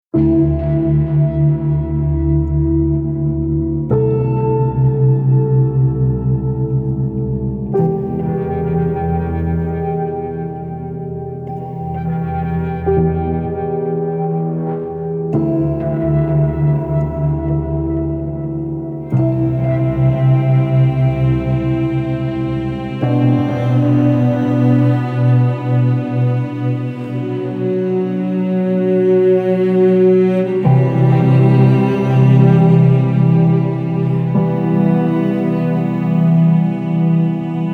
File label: Toydrum Breath